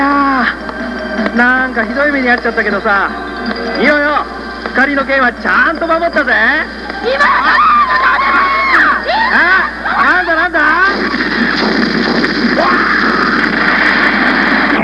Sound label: blow